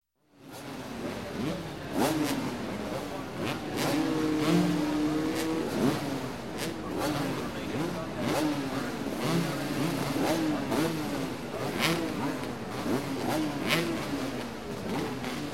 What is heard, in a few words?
Motorcycle, Race Motocross, Revving Engines; Before Start; Sound Ideas; Series 2000